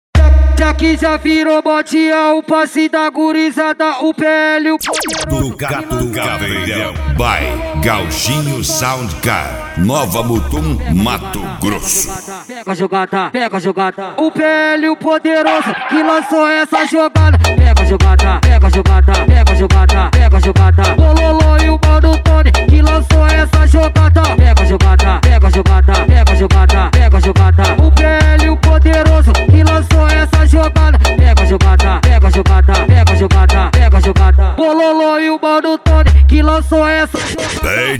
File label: funk mega bass